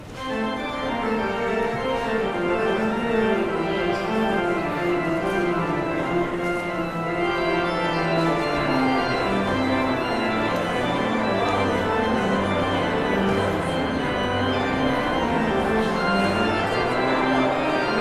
Postlude; Oh That I Had a Thousand Voices

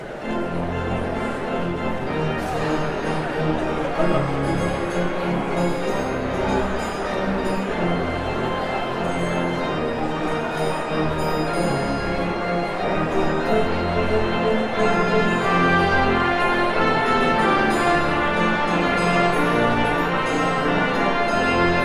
Postlude; on Beach Spring

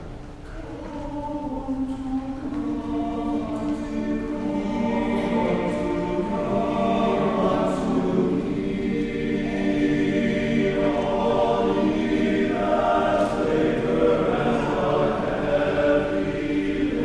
Choral

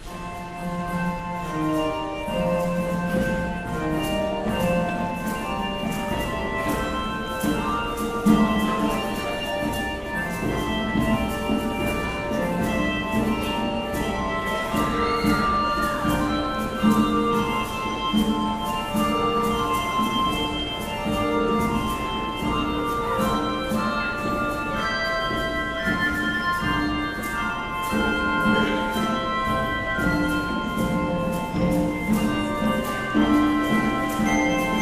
Handbells; Halle Halle Halle

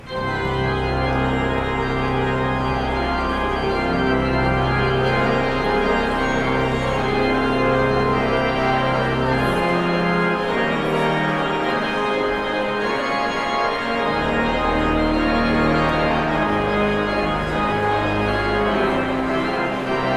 Postlude

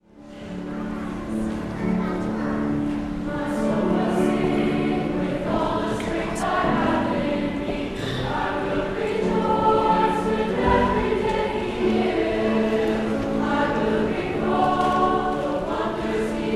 Choral

